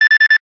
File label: pda alarm; device